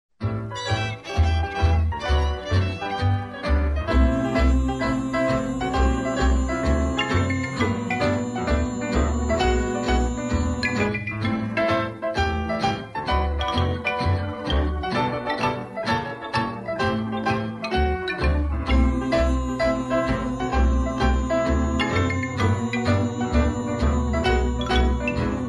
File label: with background vocal, square dance music